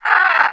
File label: Red Condor dmg